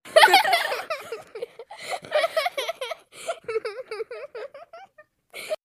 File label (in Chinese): 笑声